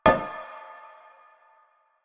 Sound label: Stone Cafe Table Impact; Warframe; NineteenNinetyNine